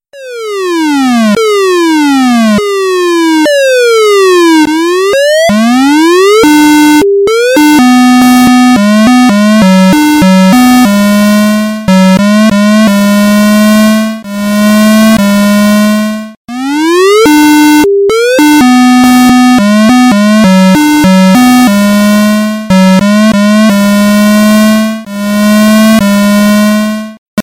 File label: clock work